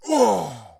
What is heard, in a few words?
voice damage male; Blind Gladiator